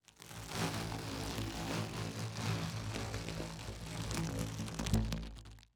Tubular,PVC pipe,plastic drawstring,pulling,crumpling,crispy,open